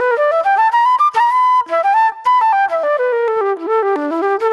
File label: Agro Fusion Flute